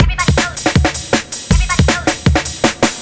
drums, Hardcore Breakbeat; Big Fish Audio; Hardcore Breakbeat Action

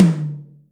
Room Hi, Acoustic Tom

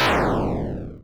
synth, amiga med

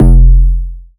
Bass F, Bass Synth

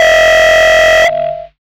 TRACK BELL, Hard & Loud Techno; USB Soundscan vol.01; AKAI

